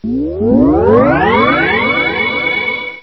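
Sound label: laser teleport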